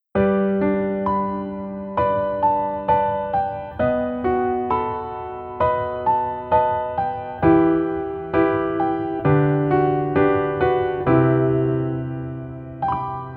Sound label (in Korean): Piano ver, 여자키